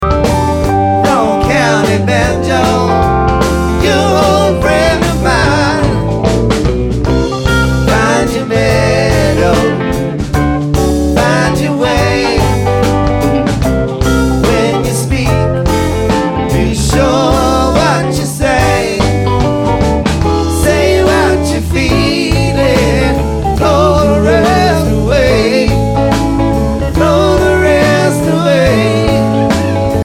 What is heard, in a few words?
Banjo